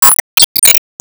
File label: Enemy Ufoblock Common Appear; Disney Star Smash; Android, iOS, Mobile